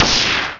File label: ballistic attack